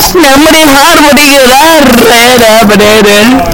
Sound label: shower